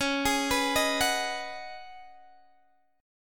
C sharp 9th Suspended 4th C sharp down Guitar Open D, chord